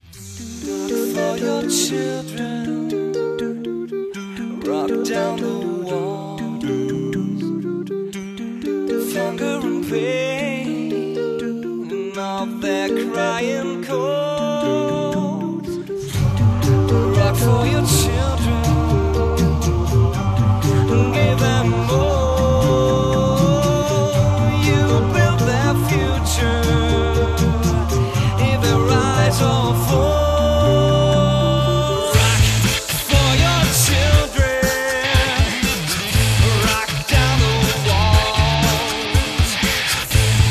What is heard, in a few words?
acapella; Rock for Children